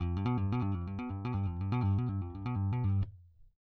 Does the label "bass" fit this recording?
Yes